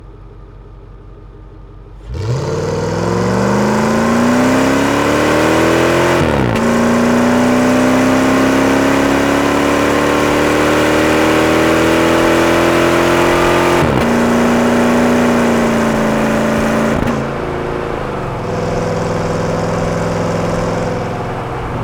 Jeep Grand Cherokee WJ 4.7 engine single acceleration, V8 Magnaflow; mono; SoundHolder